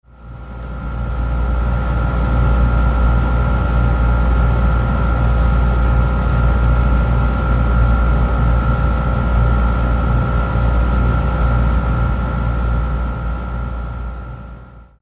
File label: Hotel Air Conditioner